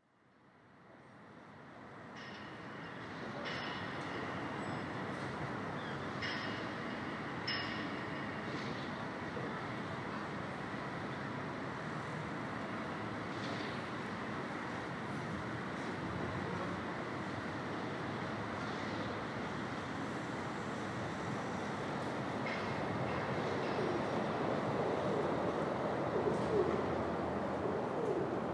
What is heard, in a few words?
Bristol City Soundscape